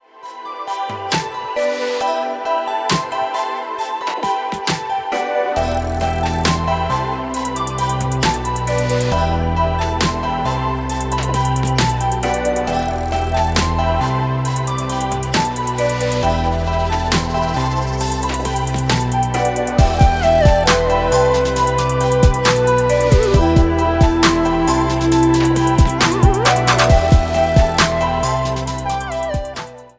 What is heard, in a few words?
Happy electronic music; MIUI14 and HyperOS 1.0